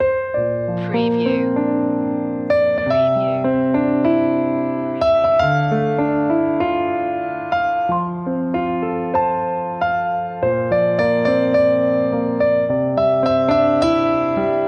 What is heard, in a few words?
piano loop; media music now